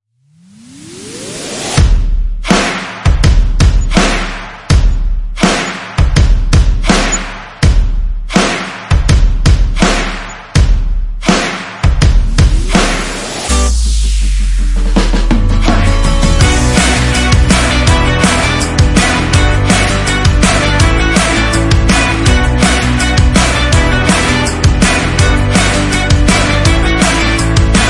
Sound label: Tv show music ringtone